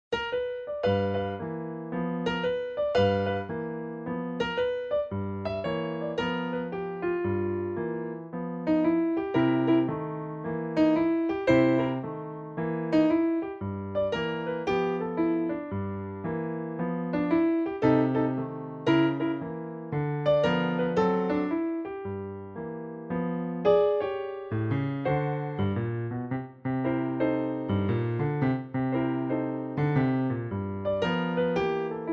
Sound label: Tritone Blues